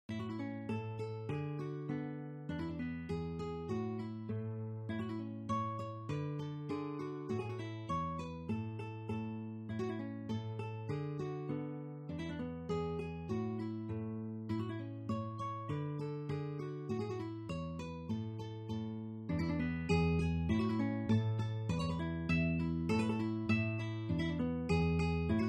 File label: schottisch; canary schottisch